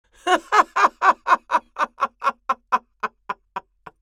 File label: Old lady laughter